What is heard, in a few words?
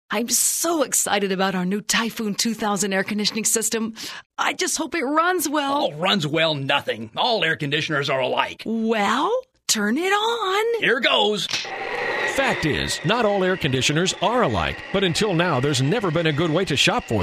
Typhoon 2000